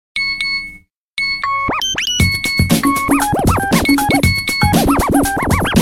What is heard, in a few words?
Nokia Scratch